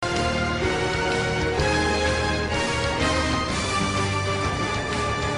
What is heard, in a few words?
Game Tune